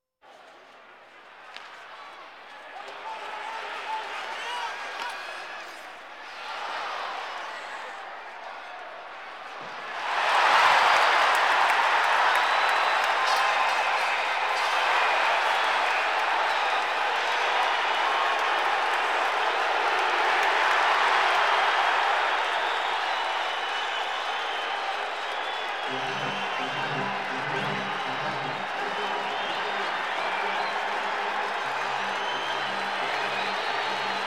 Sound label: Sports crowds